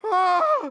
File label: die; reaperman; giants